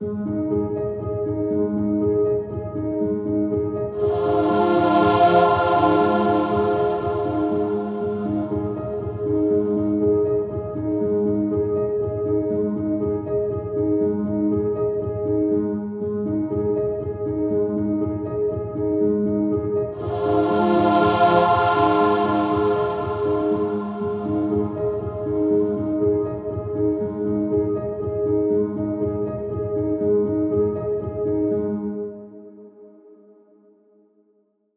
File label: Piano - Abmaj 60BPM; STEMS; Ghosthack; SkyRealm; Mood Kits; Mood Kit 06 - Inner Reflection